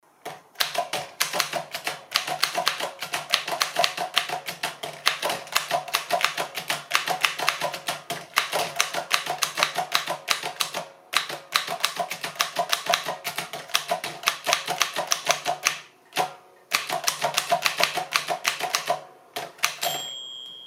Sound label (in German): adler modell 46, Schreibmaschine; Hörbeispiel Adler Modell 46